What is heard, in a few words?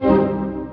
strings lo